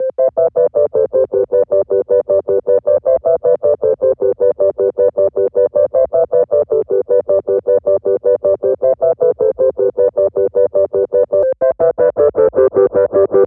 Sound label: SUB37 MOOG 084 C-Am